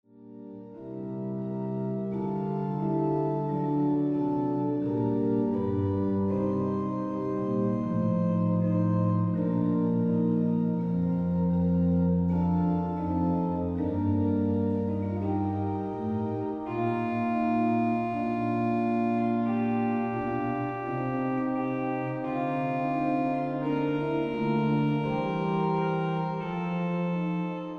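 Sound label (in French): orgue; Matthias Weckmann; Magnificat secundi toni; Tertius versus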